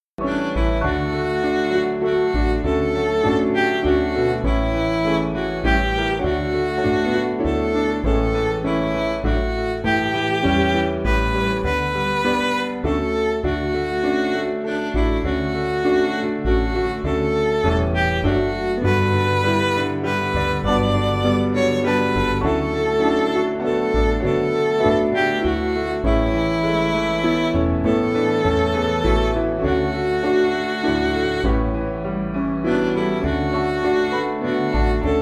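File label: Midi, Music Backing Files for Churches; Just As I Am; Woodworth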